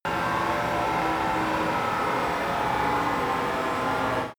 crane winch spin down

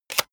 plastic device handling clack push

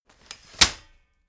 folding chair unfold fast

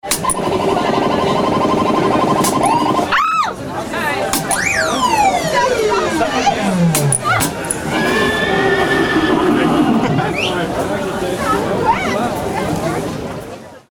octoberfest shoot gallery

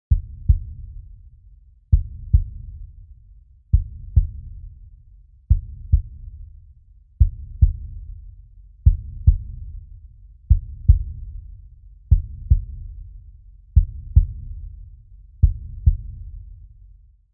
heartbeat groove

